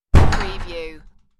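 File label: Door Bust Open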